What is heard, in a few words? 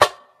damped snare drum F sharp key, Royality free snare sample tuned to the F# note